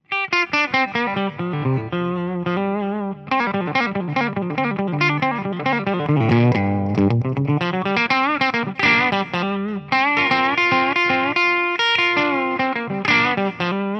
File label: bridge clean, burstbuckers